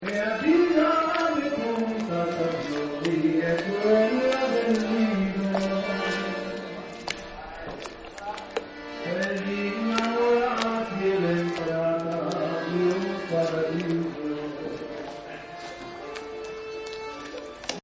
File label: Gondola Singer, Live Music Events; Concerts; Backgrounds